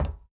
plating, Footsteps

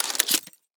shotgun insert; Weapons; Guns

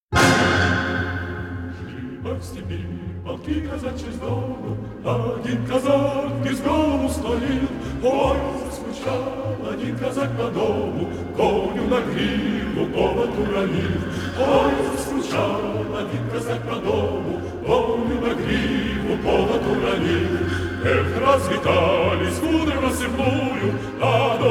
cossack song; Music

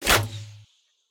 Crossbow, Fire; Weapons; Guns; civ14

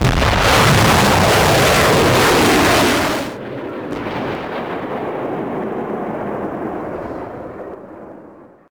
missile big, Aircraft; Vehicles

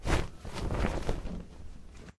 rustle

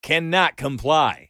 negative, Voices Emotes

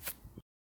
grass, Footsteps

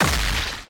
heavy smash, melee; Weapons; Civ

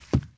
carpet, Footsteps; civ13; civ14

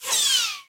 energy miss; Weapons; Guns

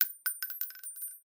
casing drop

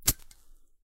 lighter

